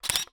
Camera Mirror

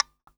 Taxi Meter

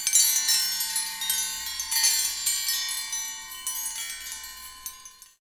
Cutlery Wind Chimes; Kitchen

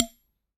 Aluminium Tube Hit

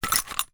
Cowbell Chain, Percussion

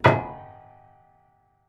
Prepared Piano

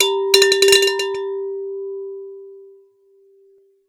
Water Clinks Mono, Glass Clink In Water

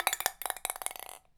Ping Pong Rattle, Large Wine Glass

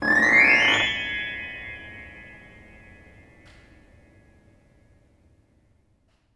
String Brush, piano; Piano Miscellaneous

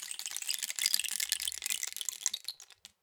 Rain Stick, Percussion Samples

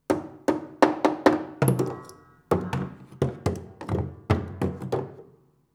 Body Thump Dense, Piano Destruction

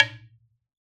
Wooden Bar Hard Strike

